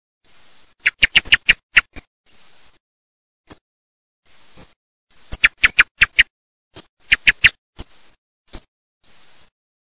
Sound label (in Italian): TORDO ZIP ZIRLO